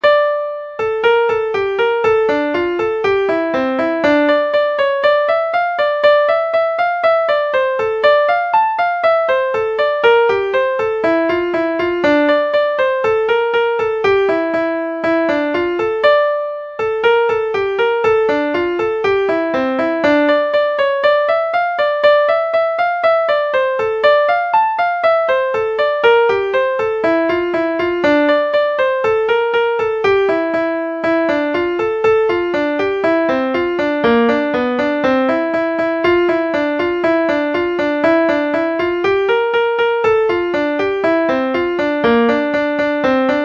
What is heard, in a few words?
stormy blues